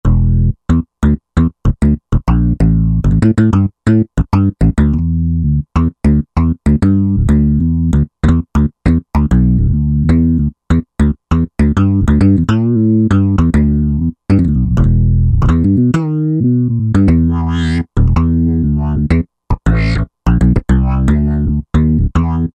Funk Bass, Roland JD-990; Factory Patches

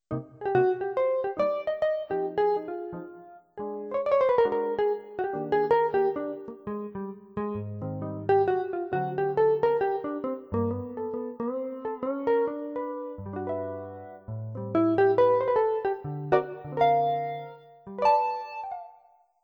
jazz guitar, Yamaha PSR-530

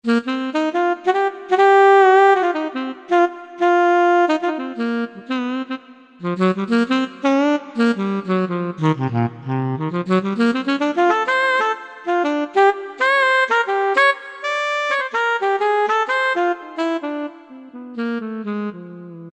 Alto Sax, Korg T3

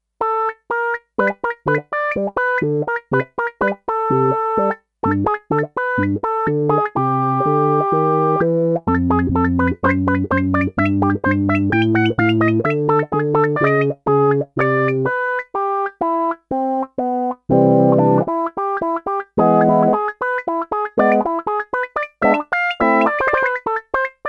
Donald Duck organ, Sequential Circuits Six-Trak; Factory Programs

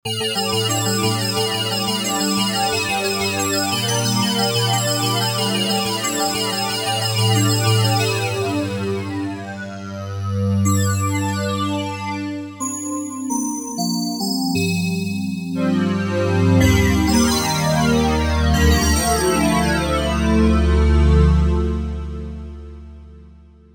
CrystalMeow, Novation UltraNova; Factory Patches

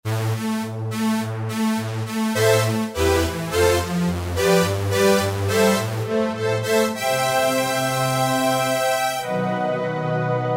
Velo OB Str, Roland SRX-03 Studio SRX

